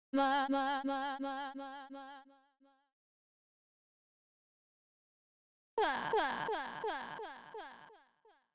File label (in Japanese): わぁ